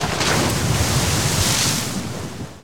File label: Auto Tires Slide Water; Automobiles